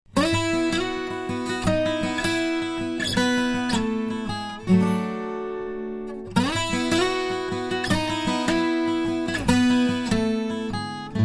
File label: 12string